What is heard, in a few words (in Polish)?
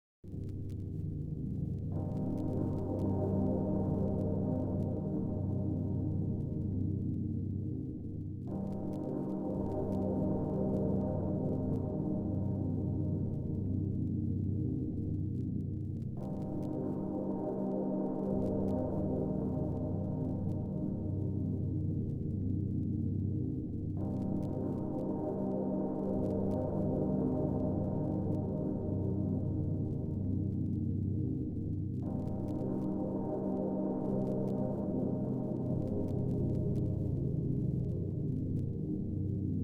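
podwodny swiat muzyka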